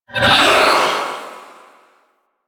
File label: Wraith Reaper Vocal Jump; Warframe